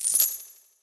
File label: coins; Blind Gladiator